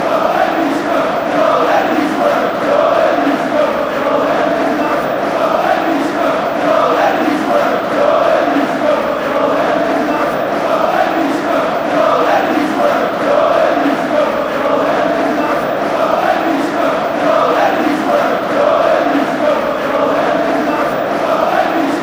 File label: we all hate leeds scum, chants